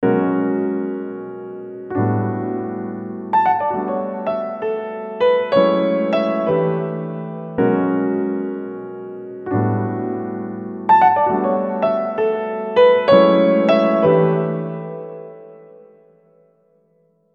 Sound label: Piano (Long Hall); Master Room XL-515